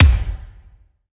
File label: Kik Hall, Bass Drum Kicks